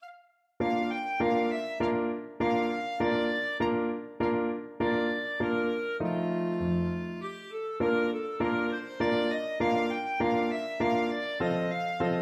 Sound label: german dance, clarinet